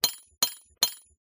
worker; idle mining empire

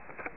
Chips Moved From Pot

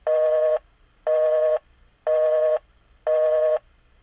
Busy Tone

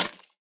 max Bet Button Down; jumanji

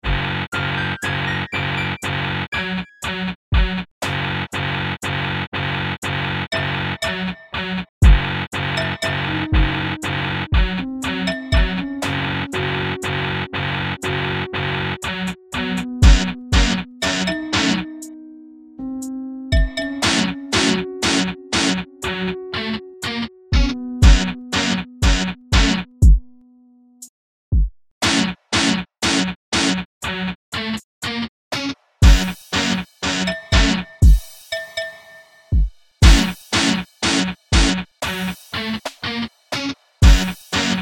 arp

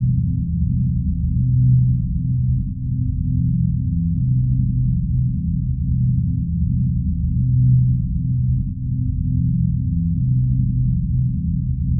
music layer sub

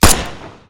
mkg45, guns

